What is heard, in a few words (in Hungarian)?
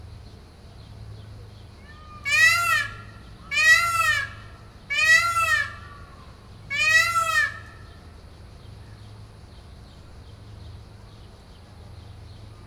távolról és közelről is, páva; makryammos beach; thassos